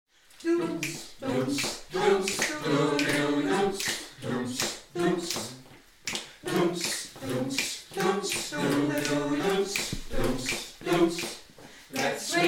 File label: Lets swing